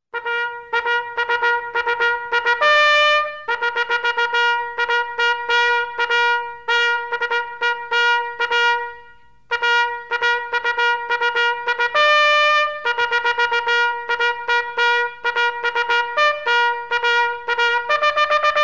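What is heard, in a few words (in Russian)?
военный горн королевский салют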